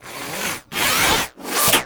Foundry Working To Loop Sleep; Warframe; Hub; Liset Machines; Tenno Foundry

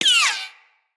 Bullet Impact Ricochet

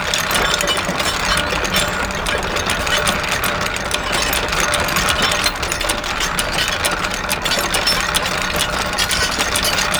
Turret Movement Loop; Warframe; Duviri; Turrets

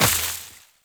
Step Veg, Footsteps, Walk; Warframe; Tenno

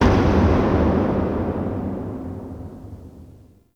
Factory Thud; Ambience; Warframe; TheNewWar; Zariman

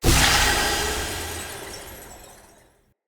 Root Cave Magic Spore Puff Large; Ambience; Props; Neathuns Root Cave

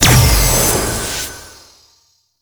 Infested Ancient Healing Healer Pulse

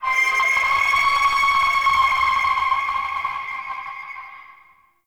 Tonal Nightmare, Ambience; Warframe; Shadowgrapher

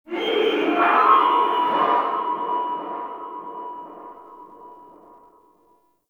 Stadium Infested Far

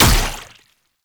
Wrist Gun Alt Mech; Weapons; Warframe; Tenno

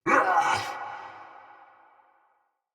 pain, soldier; Barks Battle; Mendicant

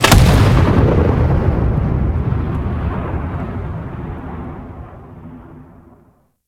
Fortress Cannon Explosion; Ambience; Gameplay; Warframe; Grineer

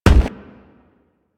Crewman Footstep Run; Warframe; Corpus

